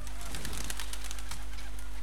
Fly Away, Birds

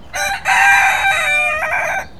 Cocks Together; Birds

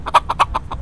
Cockerel; Birds

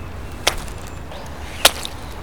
Mud Splodge, Outside

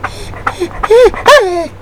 Dog Whine; Animals

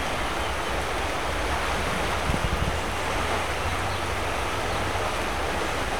River Gentle, Water

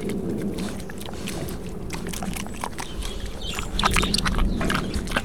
Pigging Food; Animals

